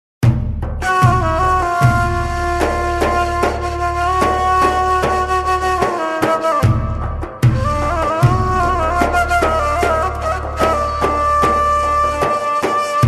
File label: islamic ringtone